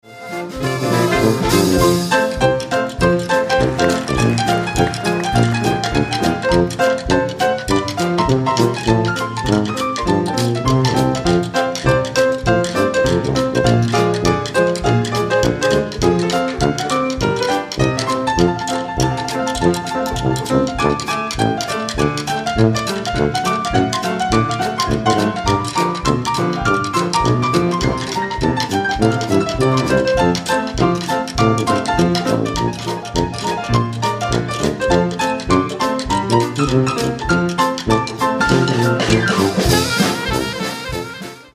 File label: Solo